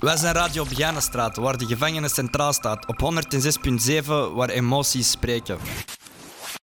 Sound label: jingle